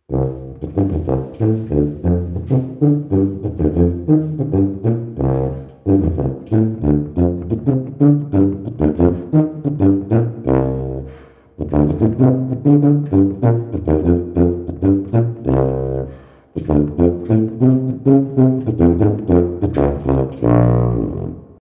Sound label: tuba